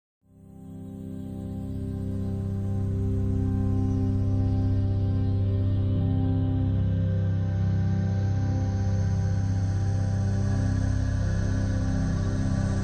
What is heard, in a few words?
Relaxation Music with Voice Introduction; therapeutic relaxation music of Serenity